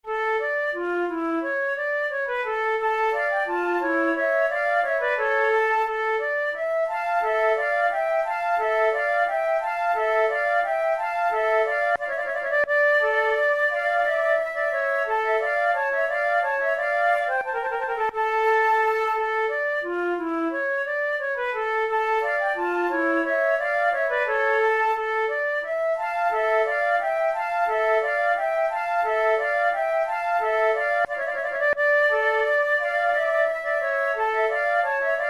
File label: allemande, flute